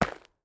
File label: dirt, walking; factorio; Factorio 0.14.21